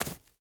stag footstep grass hard; Rust

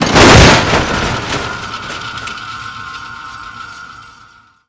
unit fall crash short

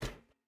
footstep burlap metal run; Rust

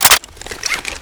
ak74u reload clipout

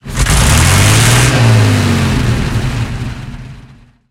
panther engine load, engines tanks; War Thunder

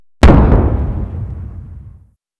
Vehicle Weapons; Red Orchestra 41-45

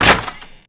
peek door open; Doors; Call of Duty Modern Warfare; blackout

